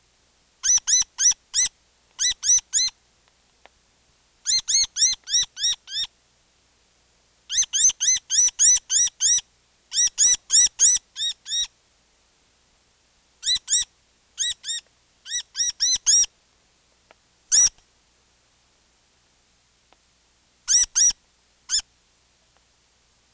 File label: Rose-breasted Grosbeak, Pheucticus ludovicianus; cardinals tanagers grosbeaks